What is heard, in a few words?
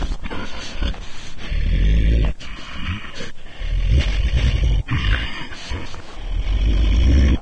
growl idle, antlion guard; Source Engine; video game; npc